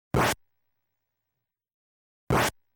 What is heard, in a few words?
Enemy Damage Sound; Kaijuu Densetsu The Tale of the Shell Monsters